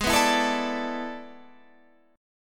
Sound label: E 11th Ab